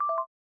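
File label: bell glitch C major; granular; accents; bespoke - Floral v.2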